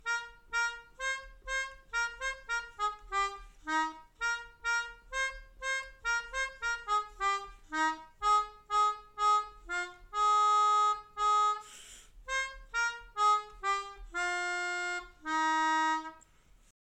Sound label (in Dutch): Sinterklaasje Bonne Bonne Bonne, Melodica